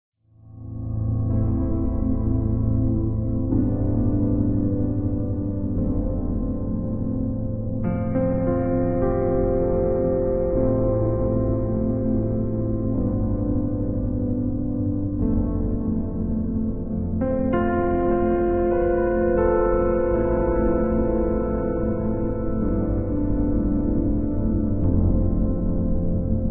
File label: Piano Piece; PAV Pavillion; Pavilion